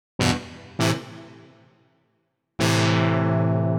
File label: DEEPHOUSE ORGAN 125 A; Keys